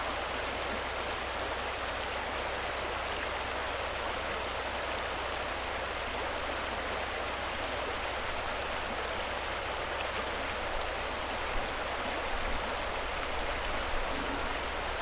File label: river; OneShot Fading Memory